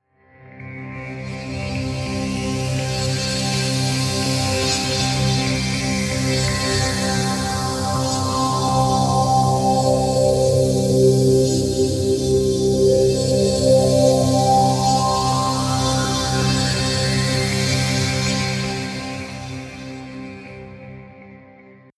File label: pad sweep vent; flaechen